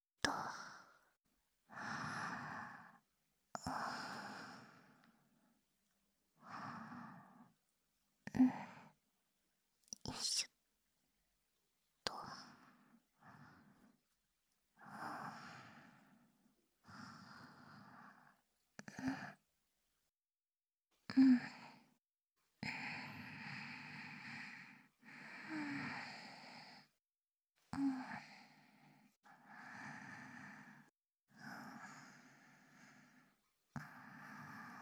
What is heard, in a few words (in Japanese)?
吐息ループ左（バイノーラルマイク）, ASMR